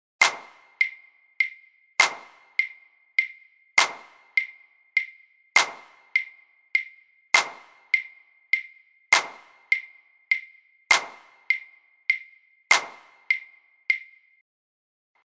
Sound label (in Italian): esercizio ritmo ternario